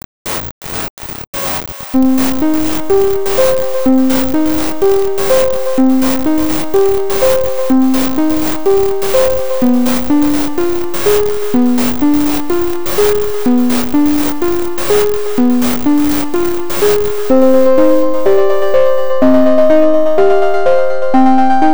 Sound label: Interlude